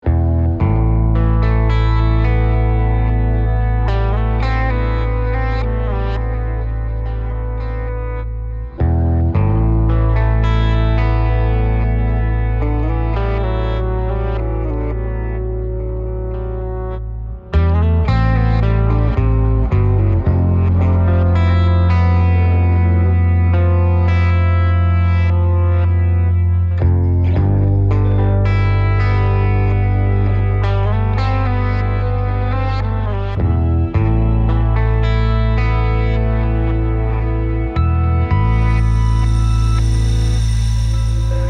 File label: guitar; reportage